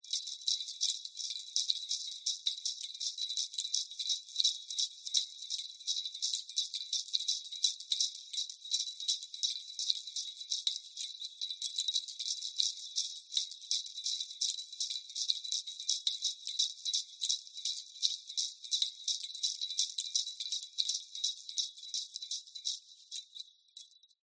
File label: Jingle Bells